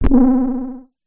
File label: Glitch Decimated; Glitch Factory 01; sound spark